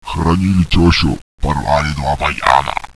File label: zombie idle; boomario